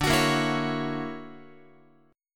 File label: D Augmented Major 9th, Guitar; D+M9 strummed; JGuitar